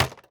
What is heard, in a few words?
plastic hit